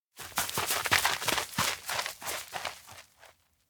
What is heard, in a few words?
steps outdoor forest run up; ken; anemoi